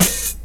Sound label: SD, Dance Mega Drums; Best Service; SD-HIP